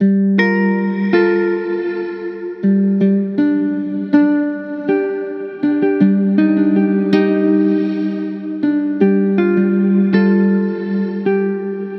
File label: Musical Loop 80 G Minor; Conceiving; Ghosthack